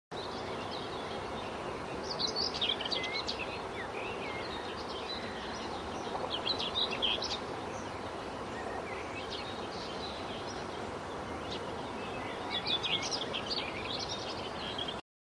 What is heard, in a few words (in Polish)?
cierniowka; zakole wawerskie